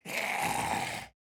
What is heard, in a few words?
Test Subject, Zombie; Zombie Specimens Vol 2